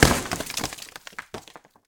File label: break; BlockHead; World Of Goo